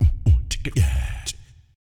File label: Beat Unlock; Pantech; Discover P9090